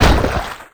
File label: Blood Splat Gib; SFX Kit; Foley - Misc